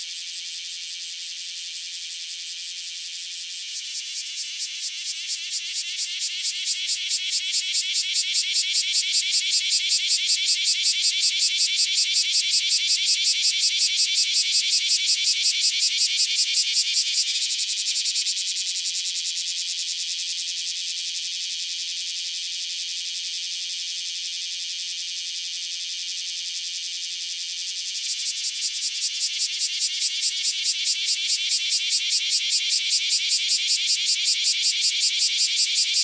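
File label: bear cicada; kumazemi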